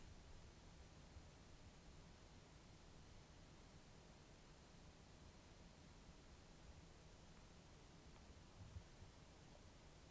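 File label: noise, mic; Fifine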